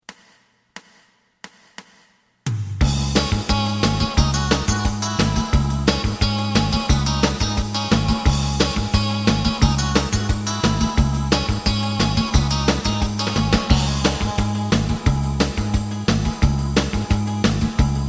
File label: lead